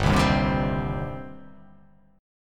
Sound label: G Suspended 4th Sharp 5th, Gsus4#5 chord; strummed; JGuitar